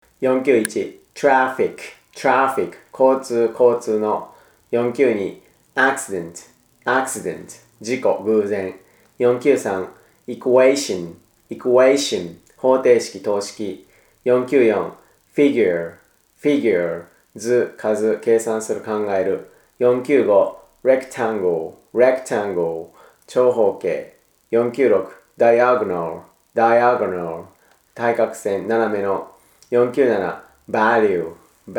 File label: tango